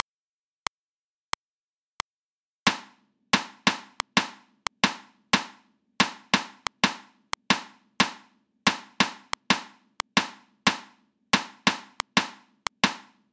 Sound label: rhythms 16ths at 90 bpm